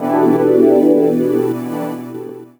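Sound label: Pad Synth; Equipped Music; Loungin House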